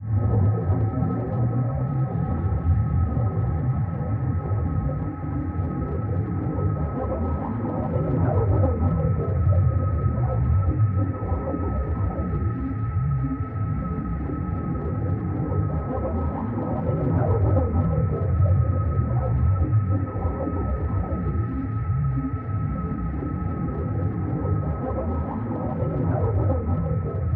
Ball Movement Loop Drone

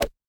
Ball Player Hand Hit Golfball Med

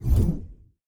Hand Paddle Release Hard

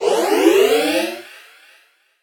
Progress Bar; Quest Line; Quests

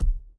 Ball Player Hand Hit Tackleball Extra Light

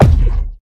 Player Head Collide

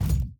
Hand Paddle Attack Med

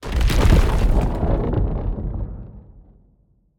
Trap Crumble; Scraprun; Deathrun

